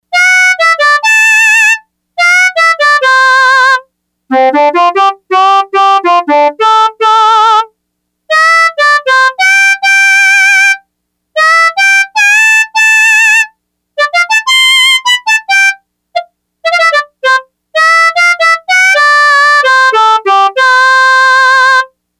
Harmo solo, Yamaha DX100; Factory Patches

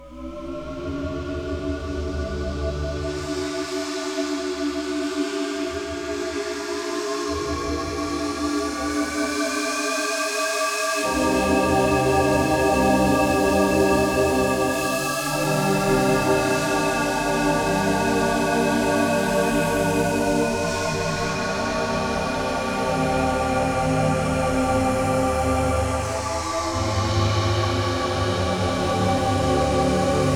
Wow Jet, Roland D-70; Factory Performances

